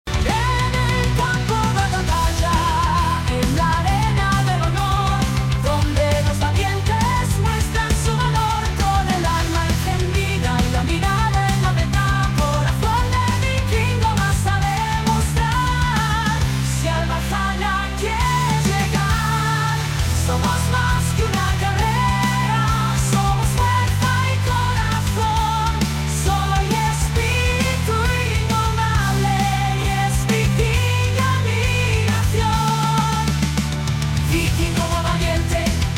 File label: Folk Rock